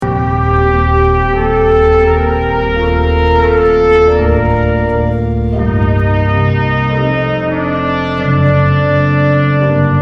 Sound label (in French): orgue et trompette